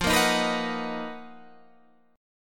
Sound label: B Major 7th F, chord; Bmaj7/F; strummed